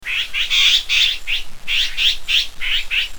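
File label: long-tailed tit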